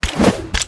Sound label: atk katana